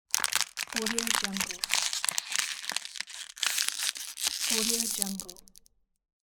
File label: crushing plastic bottle with hands